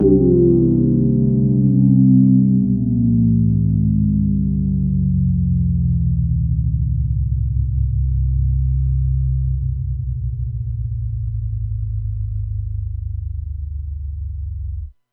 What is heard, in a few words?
booooooooowwwwwwm, reface cs